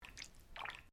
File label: squirt; splashing around in a cup of water